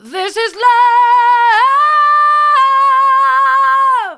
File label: this is love hard, vocal